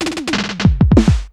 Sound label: Electro Fill, Yamaha DD-35